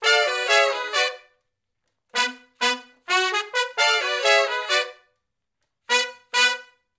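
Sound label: R&B Riff (B) harmony to unison, Horncraft; Sections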